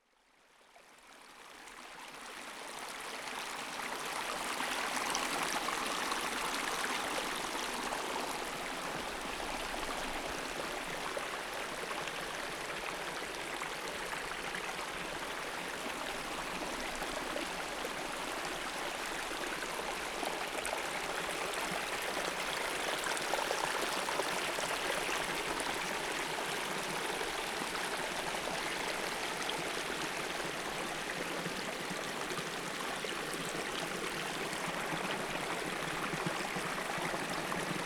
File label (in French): Ruisseau Remontee